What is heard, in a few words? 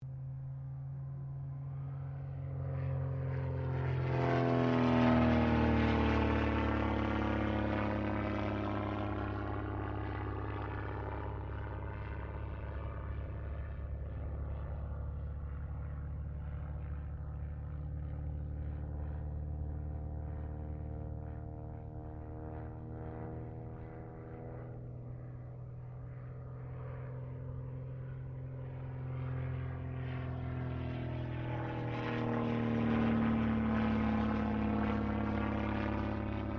AIRCRAFT CESSNA O-1 BIRDDOG CIRCLE, spotter aircraft; Airplanes; Vehicles